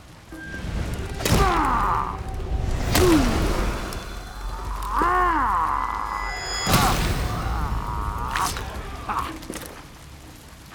exikution; for honor

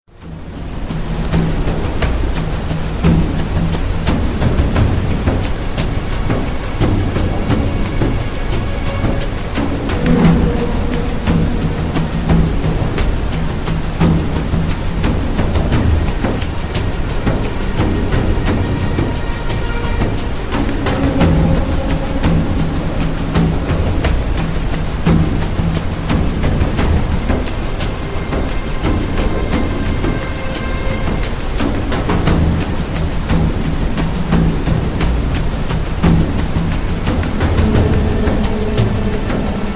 hintergrund musik; rise of the tomb raider

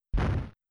Schuss explodiert; jazz jackrabbit 2

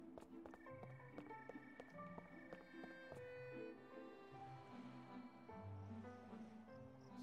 nacht; spiritfarer

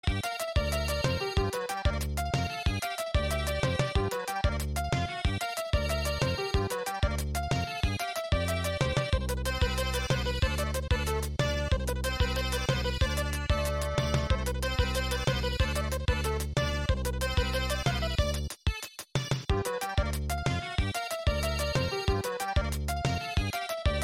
sommer; harvest moon

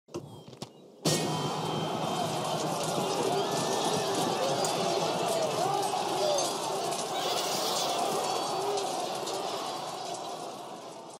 krieg; rome total war

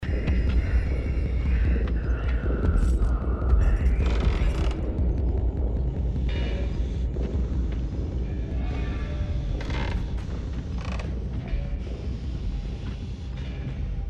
npc verfolgt; Little Nightmares; gamesoundopedia

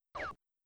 hinunterbohren; jazz jackrabbit 2

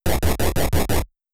schritt schwein; gamesoundopedia

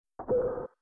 axt; harvest moon

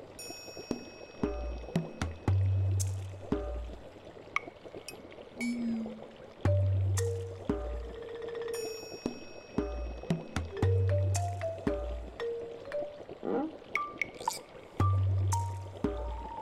zauberer musik; Stardew Valley

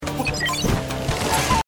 springen ausweichen; arms

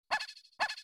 hund; harvest moon

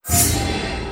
karte wird auf brett gelegt; magic 2014

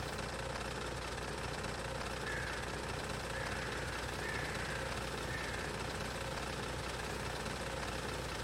schmied; Stardew Valley

